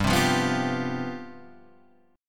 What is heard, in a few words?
C Major 7th Suspended 4th, G, guitar chord